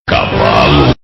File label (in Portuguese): cavalo